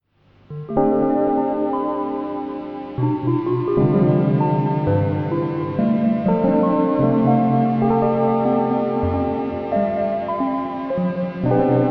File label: Casio Electric Piano Synth Jazz; Casio500